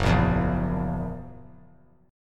F sharp 7th, down; strummed; JGuitar

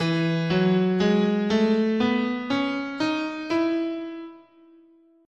E Ionian, guitar, scale

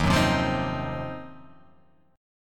D sharp Minor 6th Add 9th D sharp, chord; 11,9,10,10,12,10,x,x; chordshape

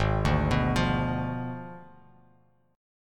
Ab Diminished 7th, Abdim7 chord, Bass Standard; Abdim7 arpeggiated; JGuitar

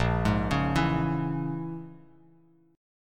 A sharp Minor 7th Flat 5th A sharp, Bass Standard; arpeggiated; 6,11,11,9

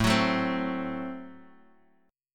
A Minor 6th A Guitar Open D

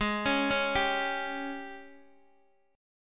Ab Major 7th Suspended 4th Ab Guitar Open D; down

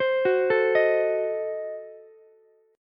D sharp Diminished 7th D sharp, Ukulele; D#dim7 arpeggiated; 17,18,17,18

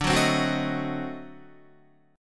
D 7th Flat 9th D down Guitar; D7b9 strummed; JGuitar

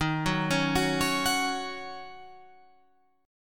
D sharp Minor 7th, Guitar, Open F; arpeggiated

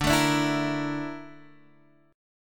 Bb Major Sharp 11th, chord

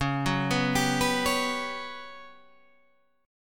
G Major 7th Flat 5th Db

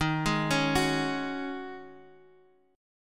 C sharp Suspended 2nd Suspended 4th, D sharp

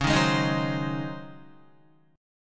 Db Major 7th Suspended 2nd Suspended 4th Guitar; 4E,4A,5D,5G,5C,6F; strummed; chord shape; 9,6,6,8,6,7

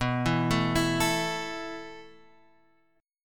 B 7th Suspended 4th B down Guitar Standard; B7sus4 arpeggiated; chord shape; JGuitar

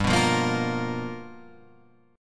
G 7th Sharp 9th G chord, Guitar Open F; G7#9 strummed; JGuitar

